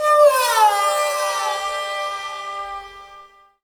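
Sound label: synth, Production Effects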